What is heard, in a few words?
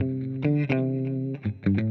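electric guitar, ethno, 125, A; eJay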